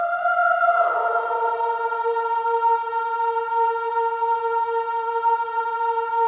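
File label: Voices, INTERVALS, 5TH DOWN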